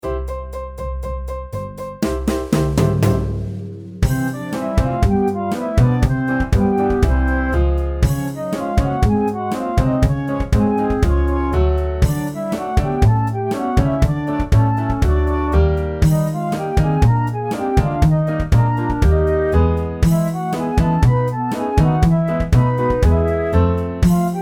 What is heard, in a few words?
brr its cold backing track, Choir Warmup; 2014 ValueSeries